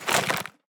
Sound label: soulcaller tower block out; enemy